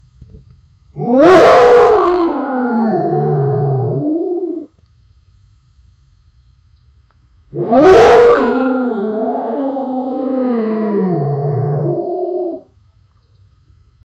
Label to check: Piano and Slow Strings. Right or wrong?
Wrong